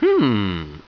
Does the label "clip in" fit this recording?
No